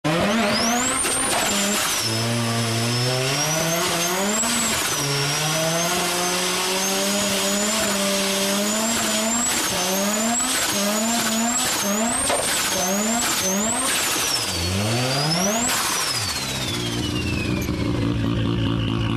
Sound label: Rx 7 Turbo